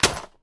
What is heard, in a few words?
metal crate break